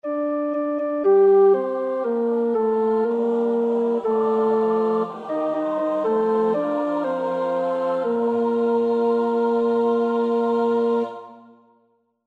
tenor, koorpartij oefening; Schütz (Heinrich); Matthäus Passion SWV 479

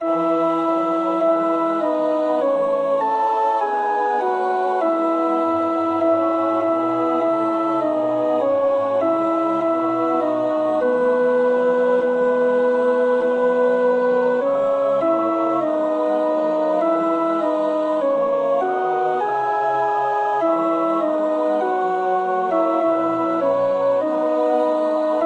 alt, koorpartij oefening

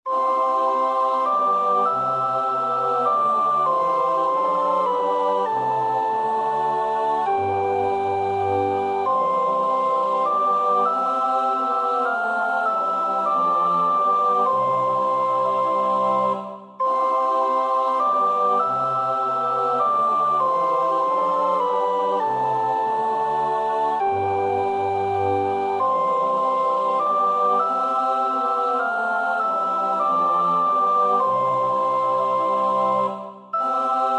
sopraan; Bach (Johann Sebastiaan); BWV 019; 7 Lass dein Engel mit mir fahren

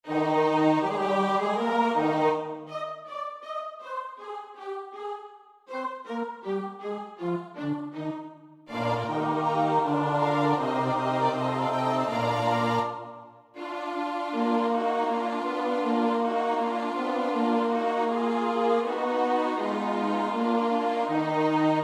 S-A-T-B, koorpartij oefening; Jommelli; REQUIEM

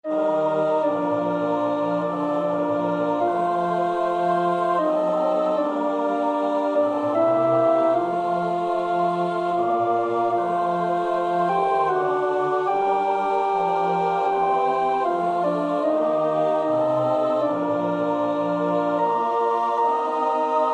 alt, koorpartij oefening; Reger (Max); 7 geistliche Volkslieder

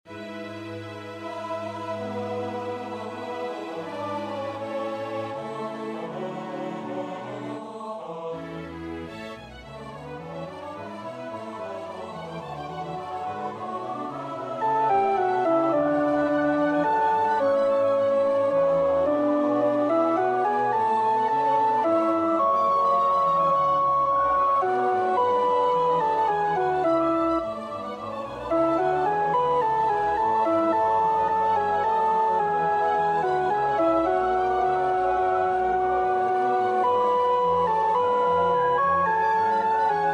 alt, koorpartij oefening; Part III- On the Third day of the Festival of Christmas; Chorus- Lasset uns nun gehen gen Bethlehem

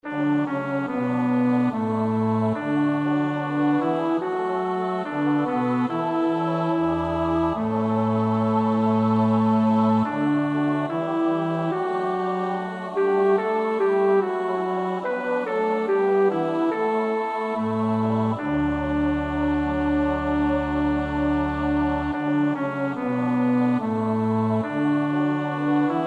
bas, koorpartij oefening; Bach (Johann Sebastiaan); BWV 011; 06.Nun lieget Alles unter dir

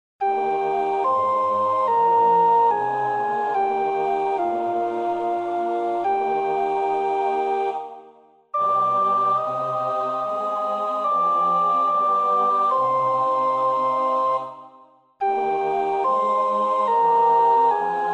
sopraan, koorpartij oefening; Graun (Carl Heinrich); Der Tod Jesu

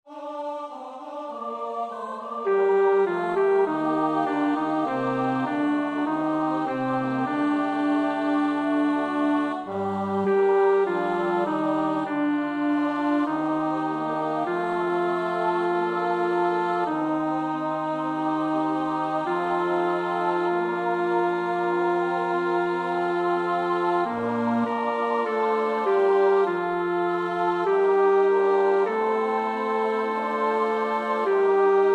bas, koorpartij oefening; Schütz (Heinrich); Matthäus Passion SWV 479

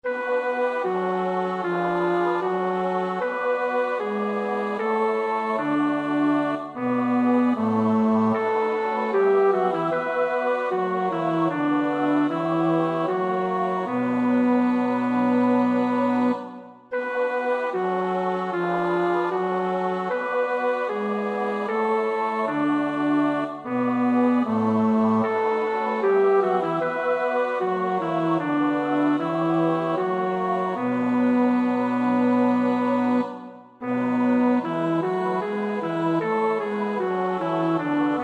bas; Bach (Johann Sebastiaan); BWV 092

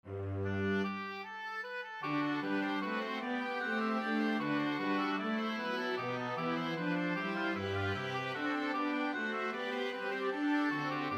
sopraan, koorpartij oefening; Bach (Johann Sebastiaan); BWV 075; Was Gott thut, das ist wohlgethan

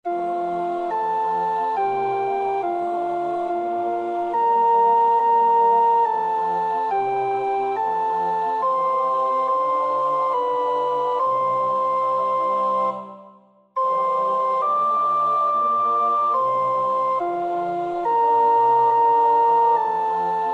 sopraan; Müller (Heinrich Fidelis); Die Passion; IV. Das Todesurteil und die Dornenkrönung

